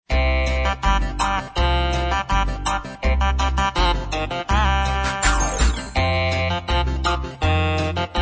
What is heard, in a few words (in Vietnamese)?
nhac chuong game